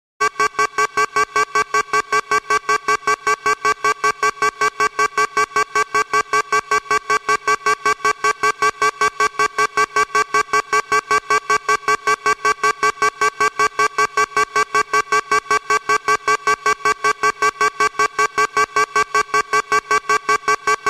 Warning Signal Alarm with Rapid Rhythmic Buzzing Tones